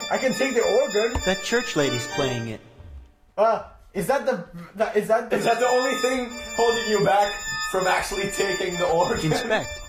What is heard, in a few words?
organ